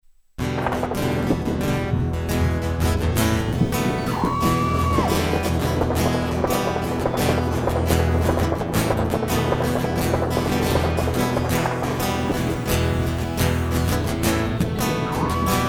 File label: Blues Interlude